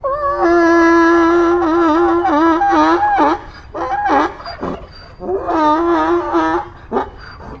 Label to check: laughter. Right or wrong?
Wrong